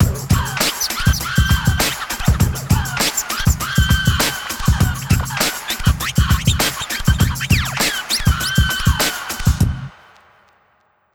100bpm light staccato cello ending underscore long